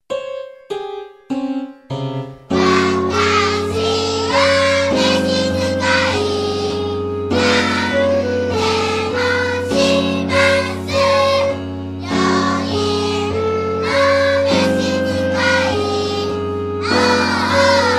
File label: chorus; mesitukai